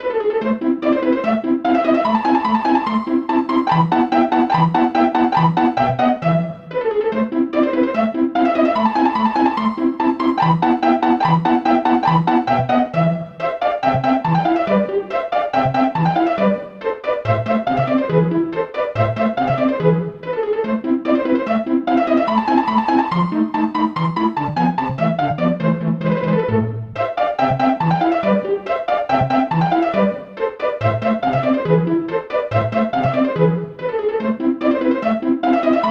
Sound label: pizzicato section